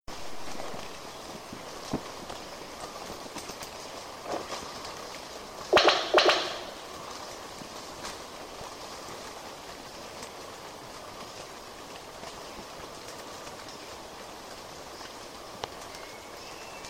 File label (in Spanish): Ave del paraiso fastuosa